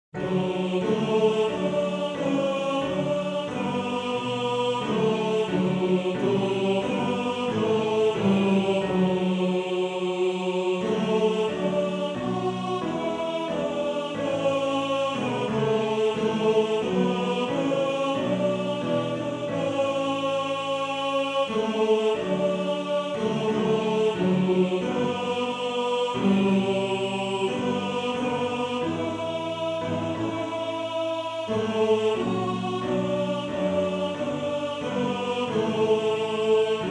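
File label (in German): Motette Tenor 1, Männerchor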